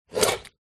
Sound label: tomato slice knife wooden chopping board